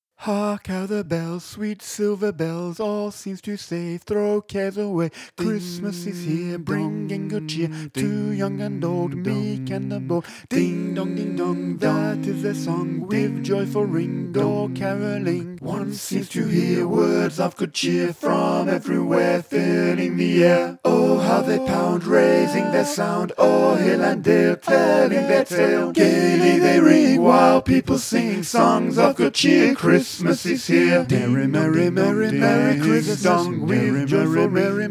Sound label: Carol of the Bells - SATB; christmas